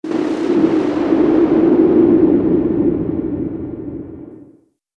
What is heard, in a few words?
noise burst